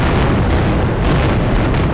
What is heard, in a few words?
boom, ships; CosmicRage